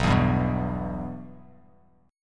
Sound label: B Suspended 4th Sharp 5th B; Bsus4#5 strummed; JGuitar